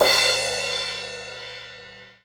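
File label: Crash Hard, cymbal; hydrogen drum samples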